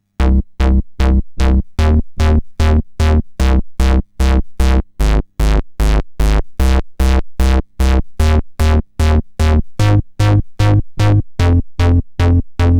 C64 Donk Bass, Commodore 64, Chiptune; 8-Bit

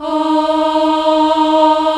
cho E3, Choir Female AH, AKAI S-1000; Retro Sampler

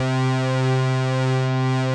c3, Organ, AKAI S-1000; ProSamples vol.55 - Retro Sampler

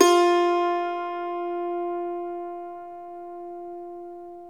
IRISH HARP

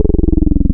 Pulse Hi Res, wavetables; Pulse 3 Hi Res; Animoog

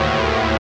Zap Chord, Pads; KJ Sawka; Drum n Bass & Beyond

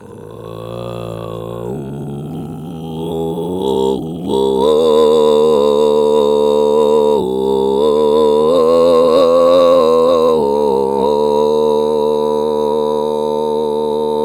MONG SING, MONGOL VA; USB Soundscan vol.60; Asian Traditions; AKAI

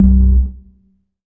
Single Electric Basses, Dance Floor Bass

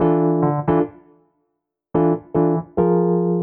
El Piano 140 E, jazz keys, Chordy Loops, 140bpm

